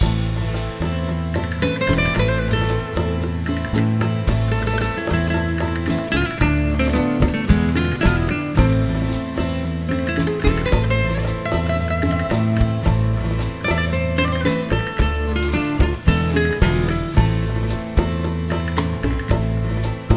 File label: latin, music; Counter Strike Model Pack; CS:S